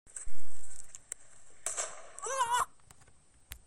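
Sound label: vzryv petardy, firecracker explosion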